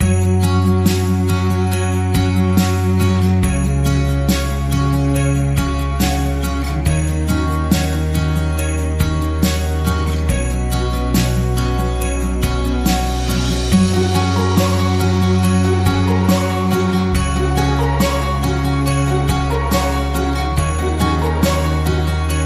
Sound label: Joyful Acoustic Christmas, No Melody